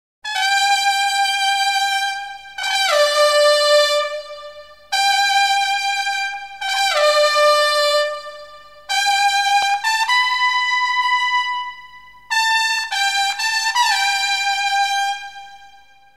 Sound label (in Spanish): Toque de Clarines